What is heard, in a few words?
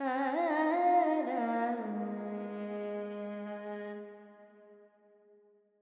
Pentatonic, Vocals Carnatic Female Alaps 70 G